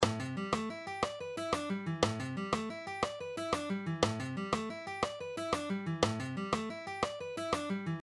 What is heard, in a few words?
String Skipping Exercises, A and E minor Pentatonics; Original Speed; Frank Gamble’s Chop Builders